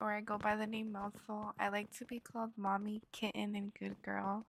mouthfull

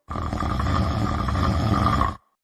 snoring

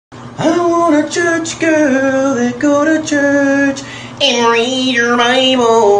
church

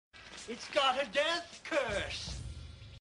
death curse

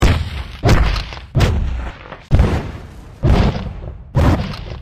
walking idle; strider

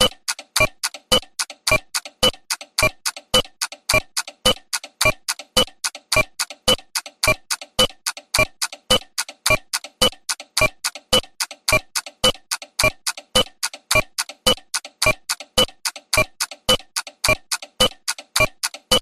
warhead tick

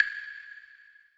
clave

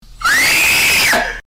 screammmmm

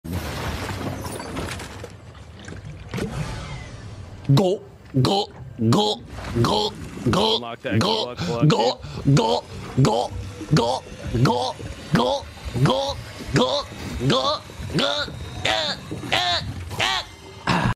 chug; ninja

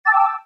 ps4 report menu appear

